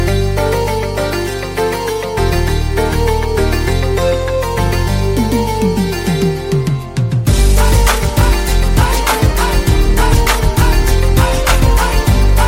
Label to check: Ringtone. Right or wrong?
Right